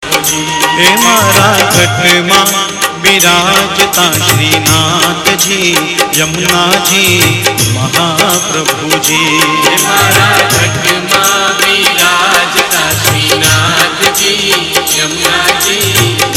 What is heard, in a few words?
Mata Ghat Ma (Male), Gujarati Ringtones, Devotional